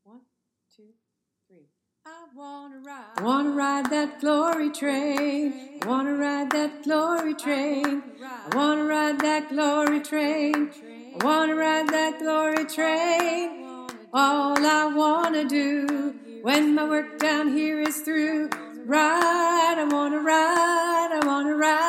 soprano, choir

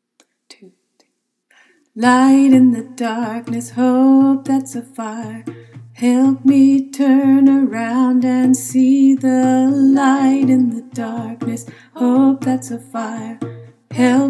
Alto lead; choir; freedom choir

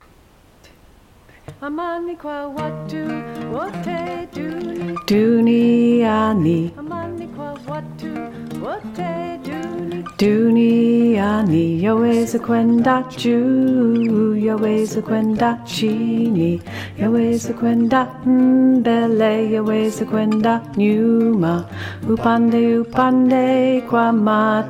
TENOR, choir

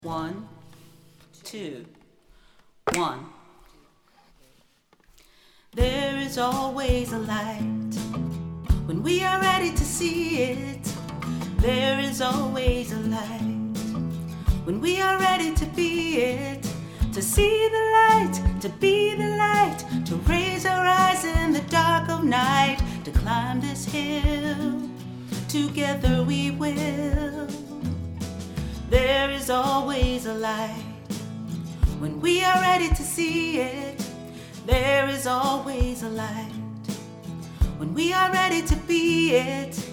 MELODY ALTO, choir; freedom choir

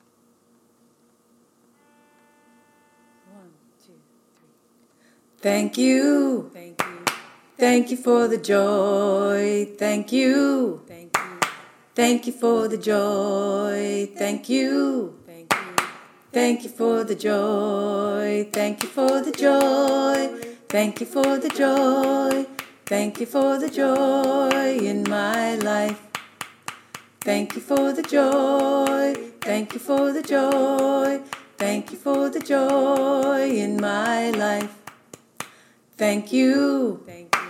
tenor; The Freedom Choir

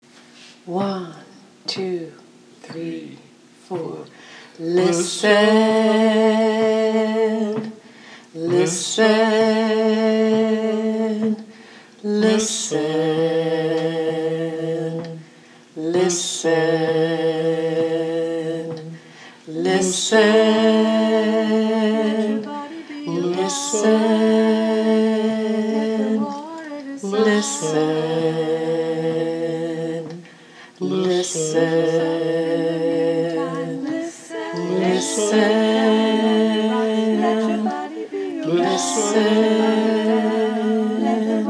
Song bass, choir

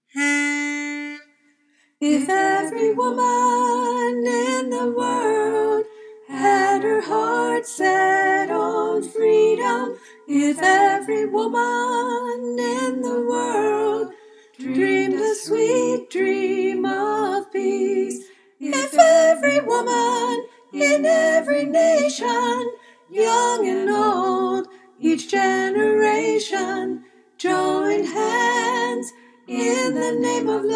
Soprano, choir

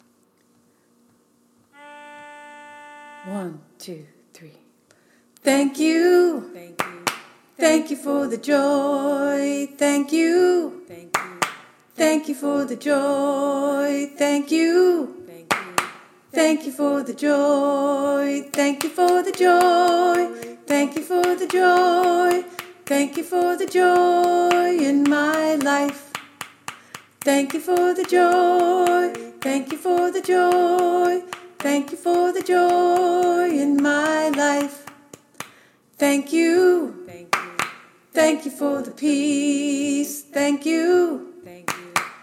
Alto; The Freedom Choir

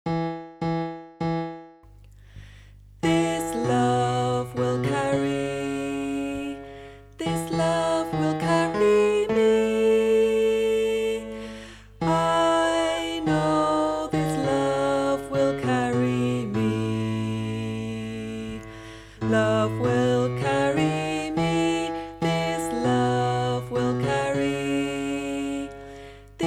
bass

